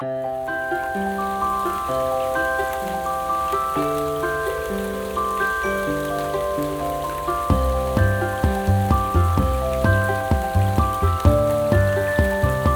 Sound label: night rain; dieselfarm